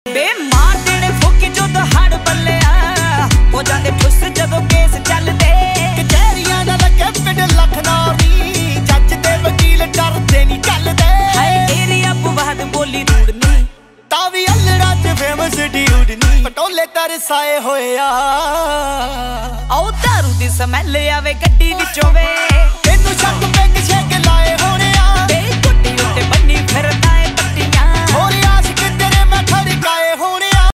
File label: DJ Punjabi Ringtone